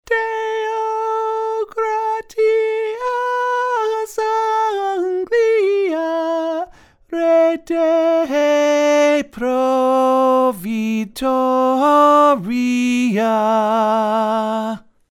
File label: Tenor Intro; Agincourt Carol